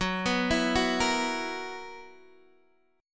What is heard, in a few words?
B Major 7th Suspended 4th, F sharp